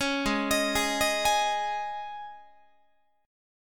G sharp Suspended 4th G sharp, Guitar; arpeggiated

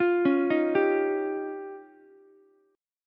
F 7th Suspended 2nd Sharp 5th, Ukulele; F7sus2#5; arpeggiated; JGuitar

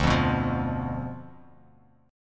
D Minor 6th; Dm6 strummed; JGuitar

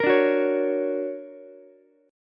D Augmented Major 7th, down, Ukulele; D+M7 strummed; JGuitar

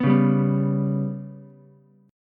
B Suspended 2nd Sharp 5th down Ukulele; strummed; JGuitar

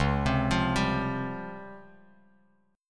C sharp Major Sharp 11th, Bass Standard; C#M#11 arpeggiated; 9,15,15,12; JGuitar

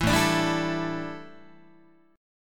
A 7th Suspended 4th, A, down, Guitar

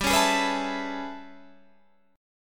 A Major 9th G, chord

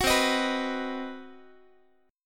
C Minor Major 7th Flat 5th chord, Guitar; CmM7b5; strummed

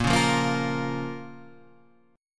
Bb Minor Bb down Guitar Standard, chord; strummed; JGuitar

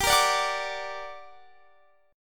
G Minor Major 7th, down, Guitar, Standard; GmM7 strummed; capo=2

